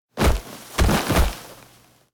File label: Bump roll; anemoi